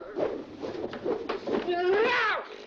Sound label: spin